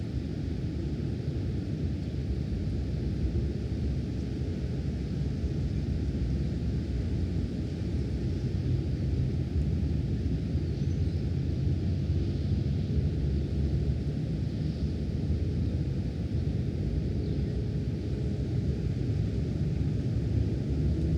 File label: exterior, ambient; nuke